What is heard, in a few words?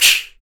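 CHINA CYMBAL, MALE PERC; Spectrasonics Vocal Planet; Jazz FX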